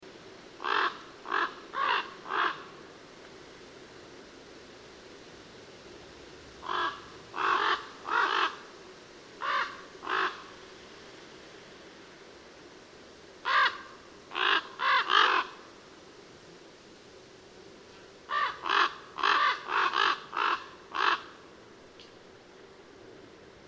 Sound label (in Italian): Corvo Imperiale